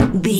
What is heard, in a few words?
metal tin impacts deep